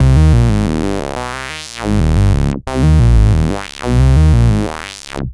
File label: Pure Analog, Keyboards